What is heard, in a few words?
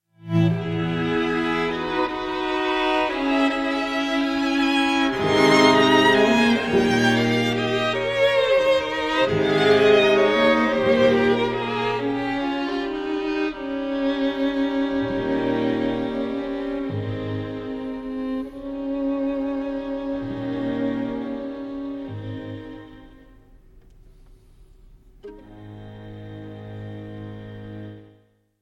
string quartet in c minor op 51 no 1; pacifica quartet